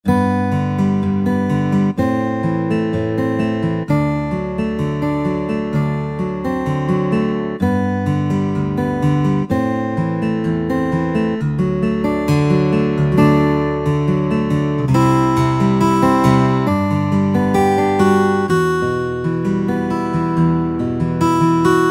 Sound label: Steel Guitar, Yamaha PSR-3000; Factory Voices; Guitar & Bass